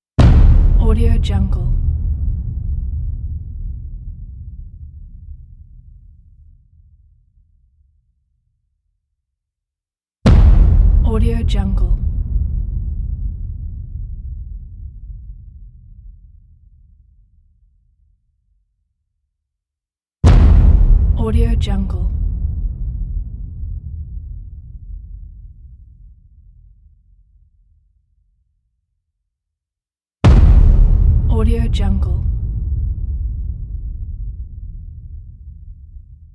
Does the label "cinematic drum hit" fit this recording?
Yes